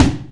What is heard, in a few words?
tom hit, roots reggae; Sounds Good - Roots Reggae